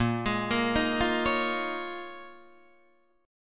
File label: Bb Minor 11th Bb down Guitar Open D